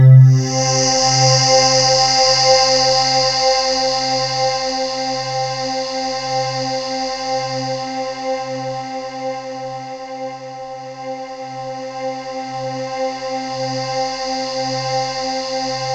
SNOWFALL, MORPHEUS

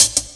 HH LOOP; Ragga & Dub Reggae; HH LOOPS; AKAI; USB Soundscan vol.27

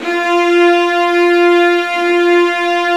Violas Bright, String Sections; Roland LCDP13

